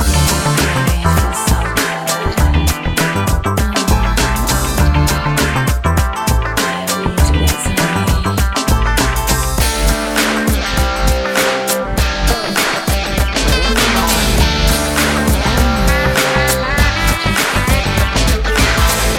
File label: music loop